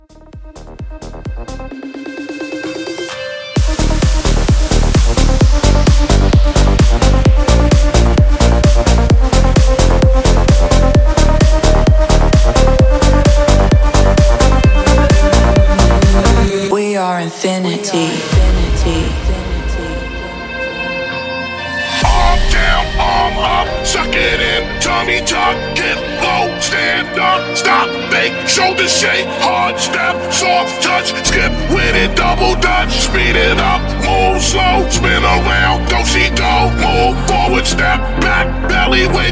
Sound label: 130 clean